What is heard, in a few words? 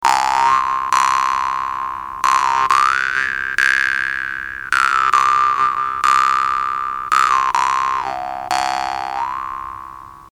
doromb